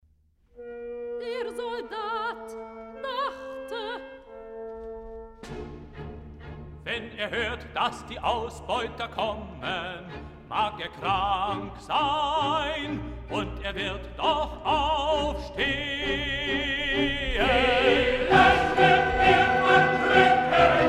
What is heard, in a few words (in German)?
Bariton-solo; Lenin; 'Der Soldat dachte'